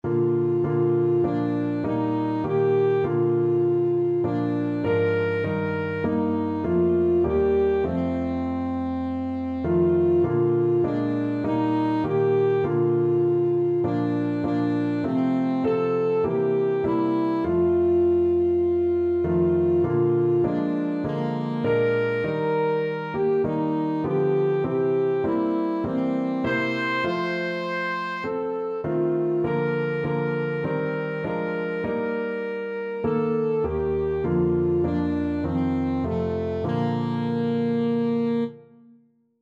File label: lead on king, saxophone